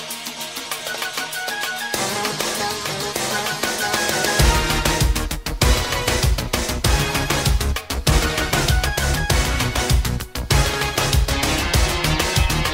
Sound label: Radio Intro